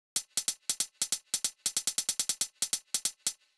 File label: drum Loop 140BPM HH